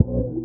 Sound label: B STAB, funky